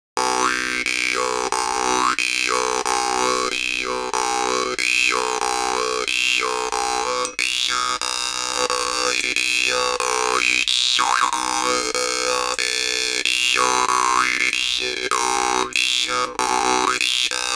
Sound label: improvisation, jews harp